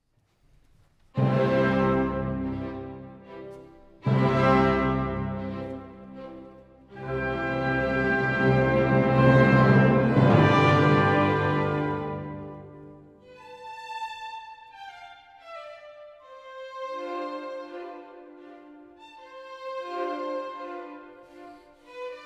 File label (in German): Symphonie C Dur Adagio Allegro; Pleyel